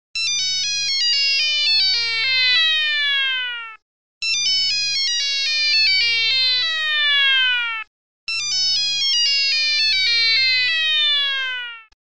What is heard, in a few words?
nokia remix